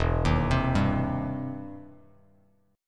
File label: E Minor Major 7th Sharp 5th, E, down, Bass Standard; EmM7#5 arpeggiated